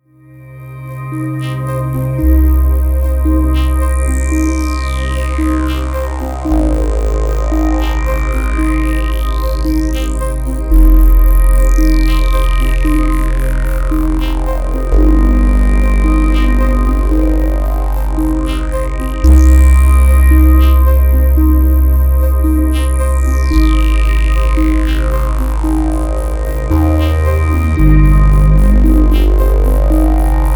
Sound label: CS60 deep bass